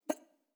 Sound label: free spins star bounce; netent